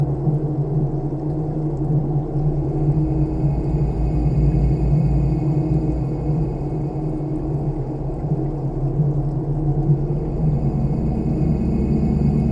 cave outdoor

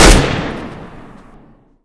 negev, weapons

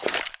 smoke grenade draw, weapons

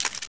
reload, weapons

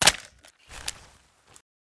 g3sg1 clip tap, weapons

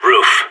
roof; SAS; radio

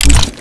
button click release

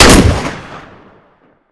mag7, weapons

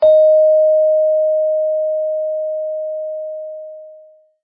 chime

